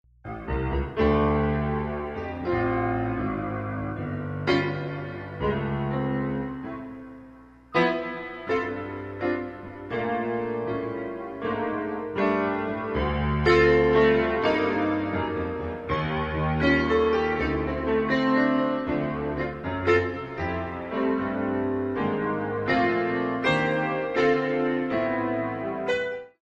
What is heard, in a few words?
piano; piano works